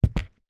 footstep wood boots resonate dry interior

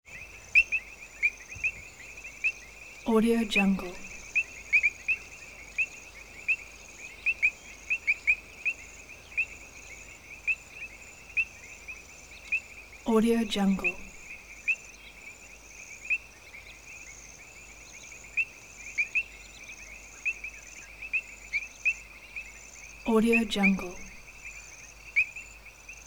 frogs and insects; prosoundfx

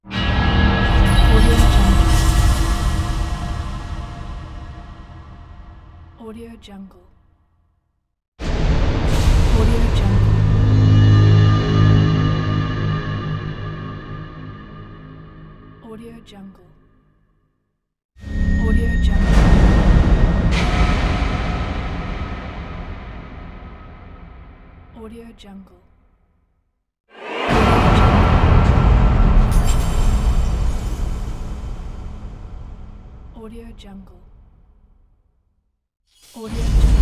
horror tension thriller

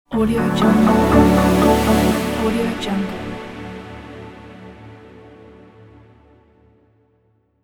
modern intro logo; slavadyadyun

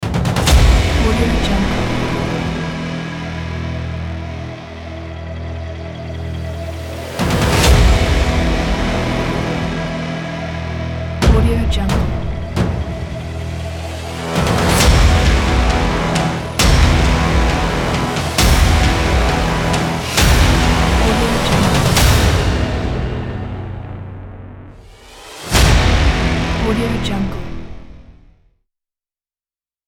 action epic trailer intro ident

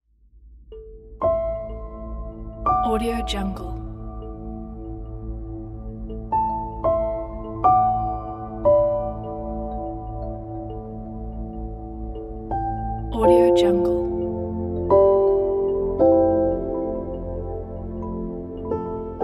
sorrow and hope documentary

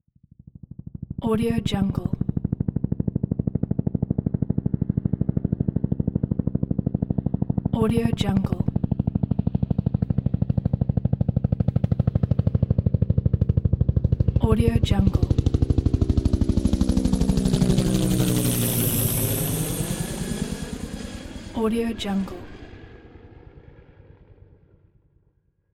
military helicopter passing by

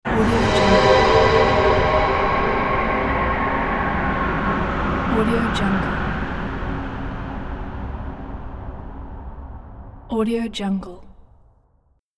the dark winds

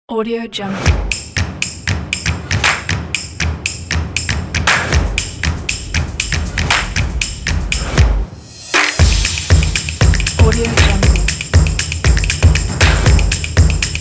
percussion

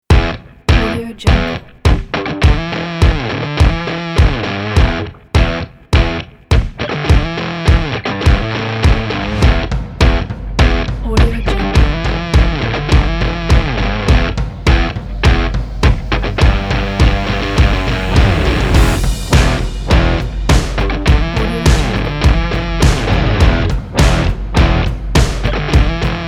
stylish rock

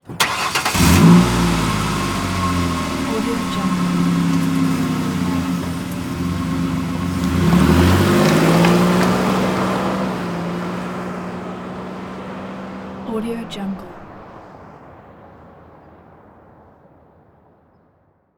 SUV idle away; prosoundfx

